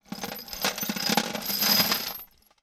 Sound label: Metal Bolts; 99Sounds; The Warehouse